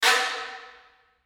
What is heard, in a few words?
fish shaker